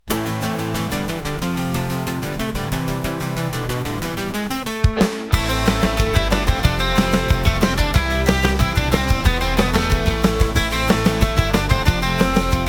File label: Instrumental